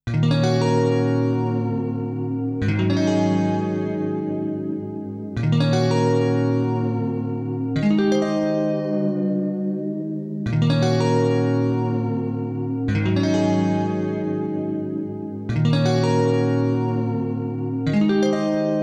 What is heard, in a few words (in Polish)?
gitarowy; Muzyka Ilustracyjna